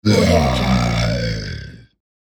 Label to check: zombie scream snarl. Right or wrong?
Right